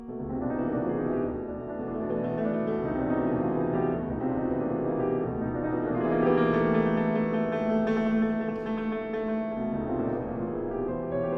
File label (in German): luftklavier